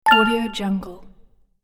gaming sound element ding

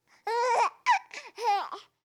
baby laughing; human sounds; خنده ی بچه

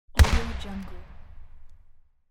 big gun shot

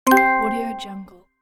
success point; game

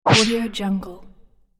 throw item infographic swoosh element; cartoon

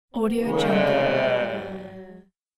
mixed group sleepy disappointed

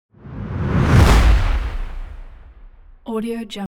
whoosh jetpack; transitions and movement

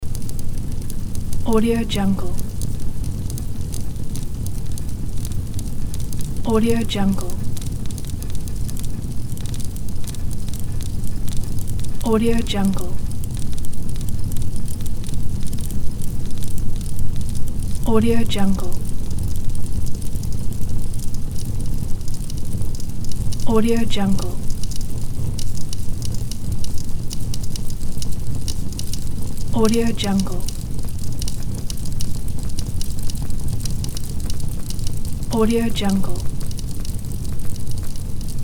building fire; آتش شومینه ساختمان

